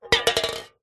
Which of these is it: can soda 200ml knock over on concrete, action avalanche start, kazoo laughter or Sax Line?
can soda 200ml knock over on concrete